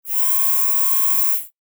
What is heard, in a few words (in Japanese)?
ロボットアーム